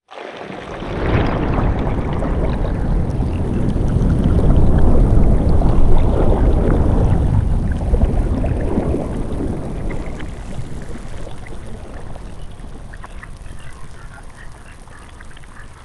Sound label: designed submerge with sizzle; sonniss gdc